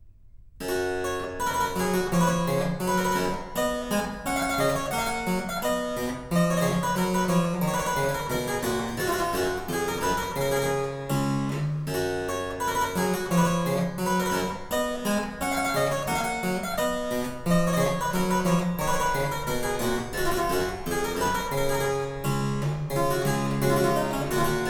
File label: Air In G Major, Harpsichord Suites And Other Keyboard Works; Purcell edition